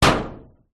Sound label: impact metal door screwdriver; zapsplat